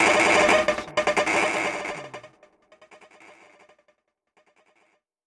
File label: horror metal chaos; soundscrate